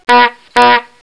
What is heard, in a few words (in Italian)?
Clackson, motori